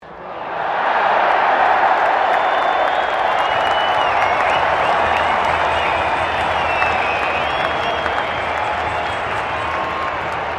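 crowd 20K Melbourne Cricket Ground cheer high, Cricket at Melbourne Cricket Ground; Crowds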